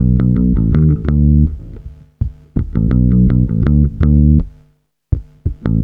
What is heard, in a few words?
BASS, BASS GTR